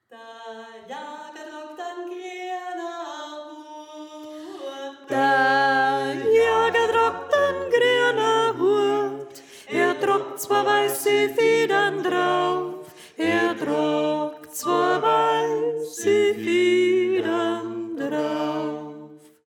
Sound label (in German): Sopran; Da Jaga Tragt An Greana Huat